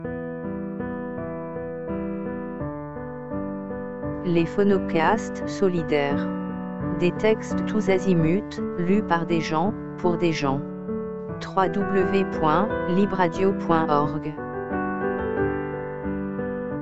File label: Jingle; Phonocast